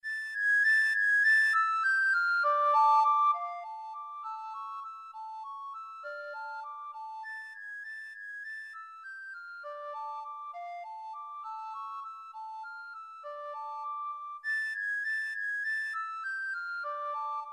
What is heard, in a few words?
recorder duet; beethoven fur elise